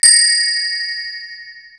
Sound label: drop effect